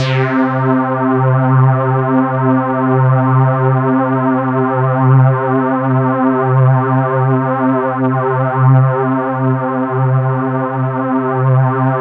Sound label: C2, Synth Line; 90 s Sample CDs; NiceBeats; SynthLine VOL-18